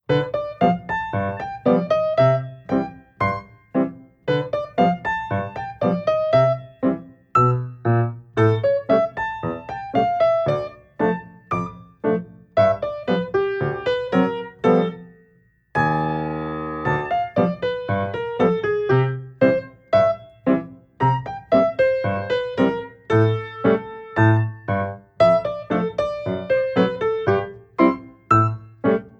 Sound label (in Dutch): Ragtime, Piano oefeningen; Lelieblad Ragtime